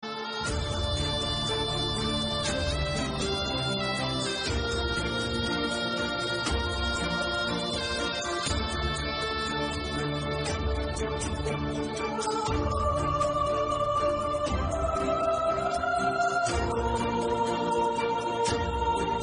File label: marathi serial bgm; rudram